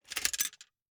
Gumball machine, metal, glass, candy, switch, mechanism, rotate, dropping, quick, hyper; Eiravaein Works; Vaeyan IV